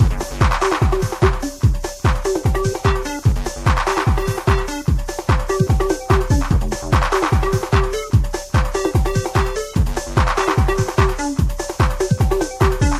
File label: inner space techno loop